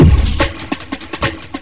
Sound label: BONGO